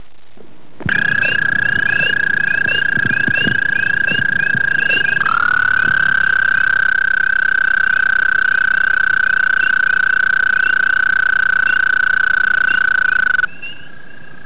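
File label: american toad; amtoad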